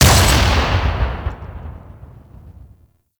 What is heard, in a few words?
Shrapnel Explosion, Fortress Gameplay; Ambience; Warframe; Grineer Fortress